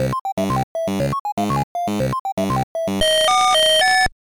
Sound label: Start Music; 765 Grand Dad